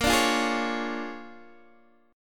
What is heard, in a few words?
A sharp 7th Sharp 9th, Guitar Open D chord; down